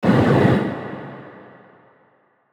brain Hit; Thalamus; CARRIER

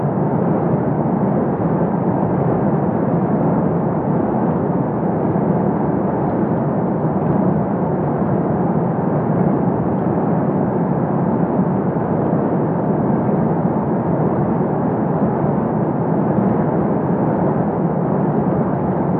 Water Ambience Moving

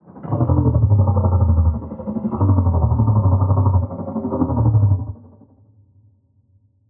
HAMMERHEAD idle; Hammerhead matriarch

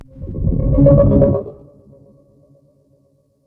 MOLOCH attack

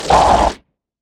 damage; Barotrauma; Crawlerbroodmother

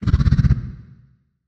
ENDWORM damage; Barotrauma; Legacyendworm

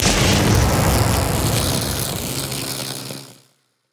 WEAPONS electrical Discharge

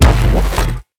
rapid Fissile Accelerator; Engineer; WEAPONS

